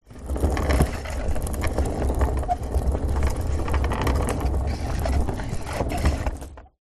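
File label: Rolling Janitor Cart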